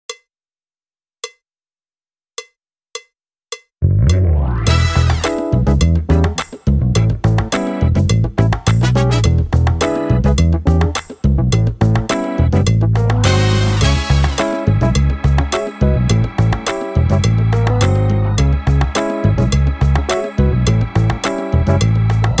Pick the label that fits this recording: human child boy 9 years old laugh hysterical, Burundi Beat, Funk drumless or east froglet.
Funk drumless